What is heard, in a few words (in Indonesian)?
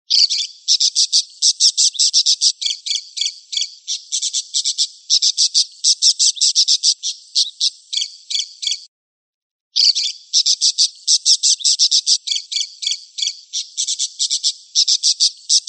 parkit master kenari